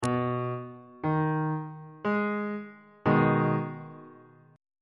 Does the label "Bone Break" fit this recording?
No